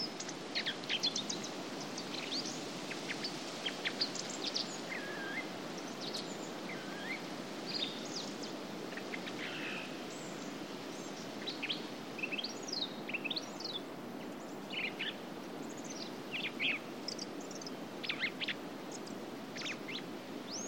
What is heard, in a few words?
turdus philomelos